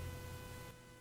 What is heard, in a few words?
ground loop; boutique